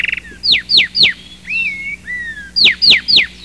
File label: ping sound